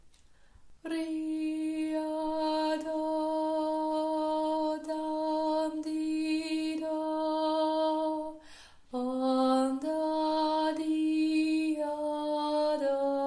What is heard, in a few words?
alto